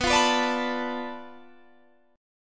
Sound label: F 6th Flat 5th B, chord